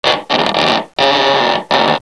Sound Stretch

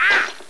ahh

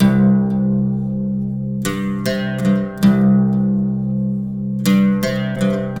SITAR ETHNO 160; eJay; Guitar

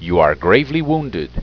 Wounded; IQ2 Demo

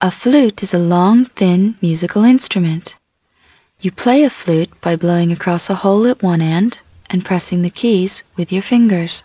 flut

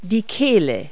THROAT

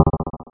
big pop; artistix

